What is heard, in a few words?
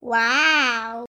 correct